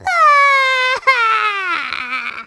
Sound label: crying; lm; toad